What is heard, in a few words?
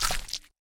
Bee Hive Grab